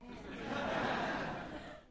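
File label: Crowd 100 Short Light Medium, medium interior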